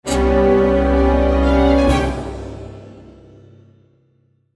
Stingers; The Dwarf Run; EV After Dalain Fell

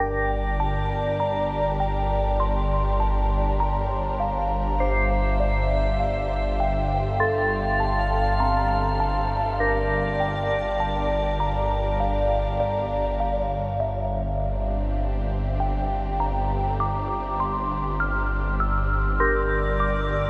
Menu Connection Theme; Eon Altar

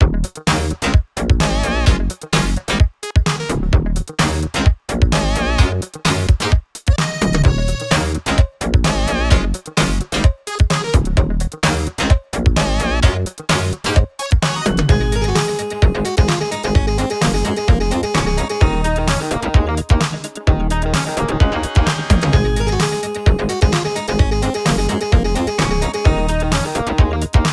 129 bpm; fashion police squad beta

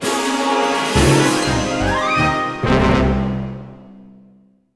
Win; Boss Super Bean Outro

